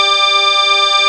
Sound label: 5THS WAVE; AKAI S6000 CD-ROM - Volume 1; SAMPLE WAVE MIXING 1; 128 PRO SYN